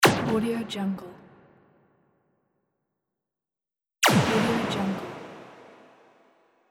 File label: plasma gun shot